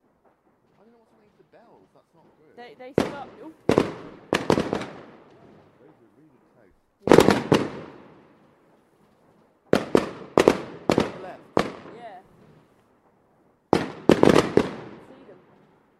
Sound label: fireworks and bells; happy 2018 from london